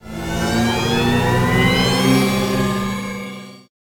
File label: respin Near win; joker pro